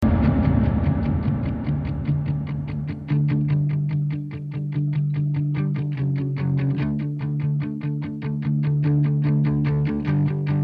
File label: rock; hellfire; kuscheln